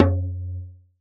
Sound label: Changgo; Roland BK-5; Percussion